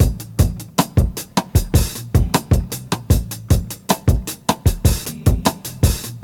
103 bpm drum loop g sharp key, Free drum groove - kick tuned to the G# note. Loudest frequency: 1600Hz; drum loops